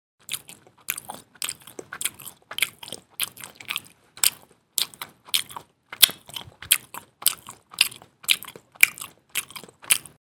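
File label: sound of chewing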